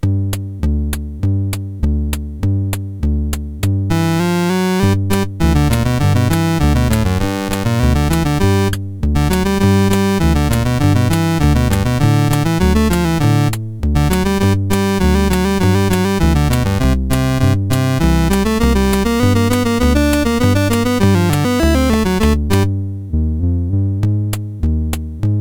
MOS6581R4; Roger Ambill

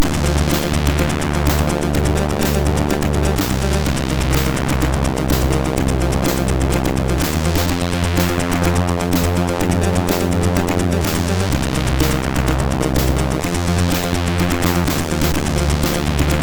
Sample Music, MOS6581R3; hvsc

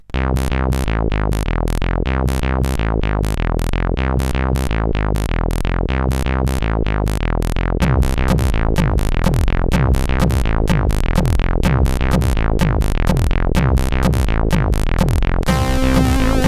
Active House, sid CSG8580R5

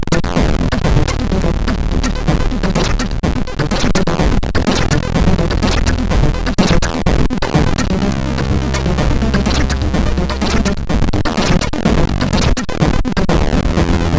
Sweet Funk, MOS6581R4

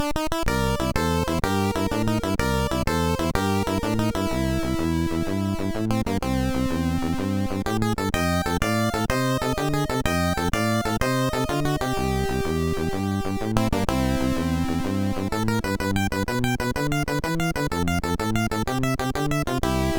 Little Boogie, MOS6581R4

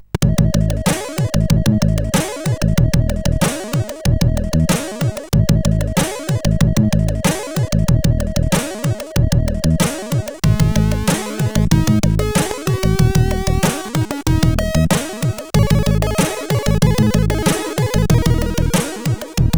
Dumpbass, sid CSG8580R5

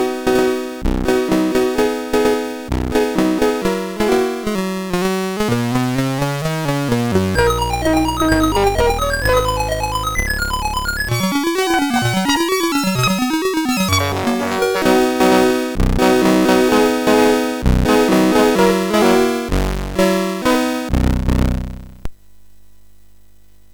Tornado Low Level, MOS6581R4; hvsc

